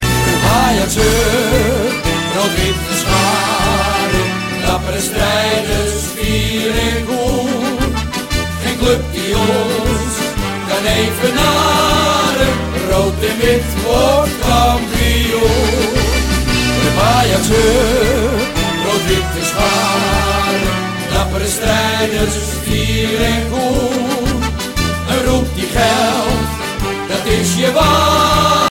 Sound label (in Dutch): ajax clublied